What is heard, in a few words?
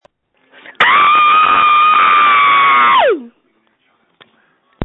Scream